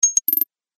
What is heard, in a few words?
alert